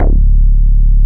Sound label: HOLLOW BASS; Masterbits; Dance Trax